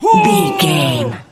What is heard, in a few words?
voice male attack